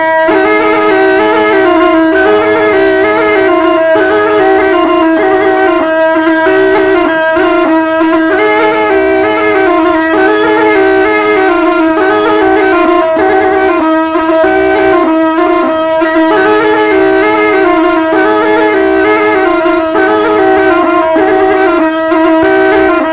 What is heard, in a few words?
arab flute